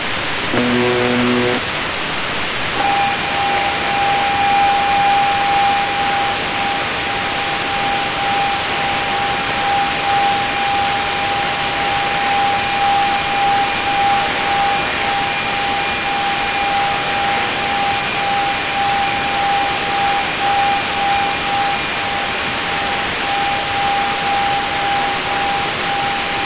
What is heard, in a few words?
buzzer 800hz tone